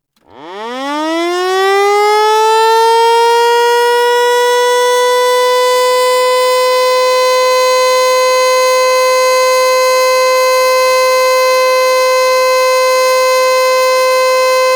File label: Eclipse Steady Tone